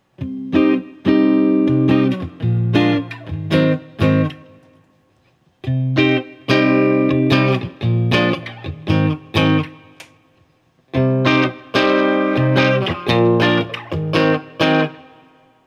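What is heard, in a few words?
Guild X500T Chords, Open Chords, guitar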